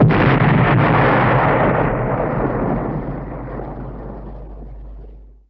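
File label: external weapon ground bridge explosion; PC Gamer IT CD 47; USAF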